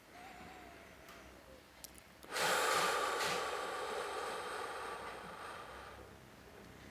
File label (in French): SOUPIR; Index des soupirs; Hadjithomas Joreige